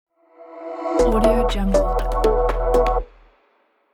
short news logo

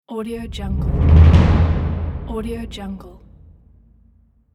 timpani roll

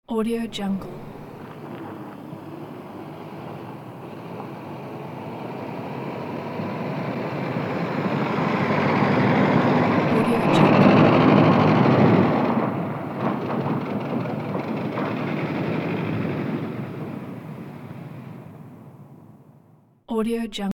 vintage car pass by

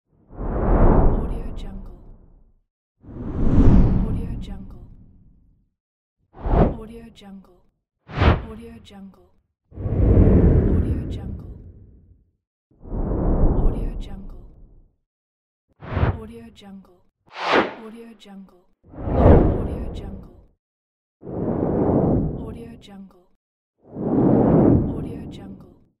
whoosh

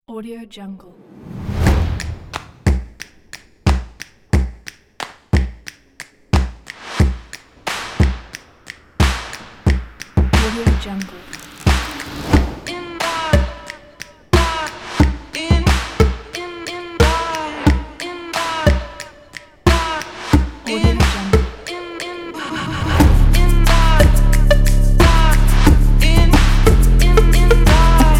fashion percussion

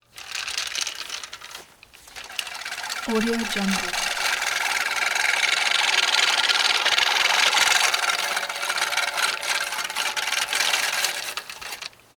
lawn mower old push style slow pass by

